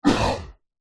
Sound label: science fiction alien cough; zapsplat